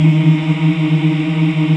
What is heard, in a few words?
VOX AFMM, VOX Afro Mms, Africa